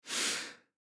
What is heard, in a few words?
Human Breathe Deep Element Male; Breathe Deep Element Male Adult; Middle Age; Breathing; Blastwave; Humans